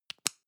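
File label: lipstick close, Bathroom; haus-garten; badezimmer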